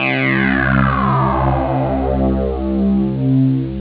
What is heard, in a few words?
down, synth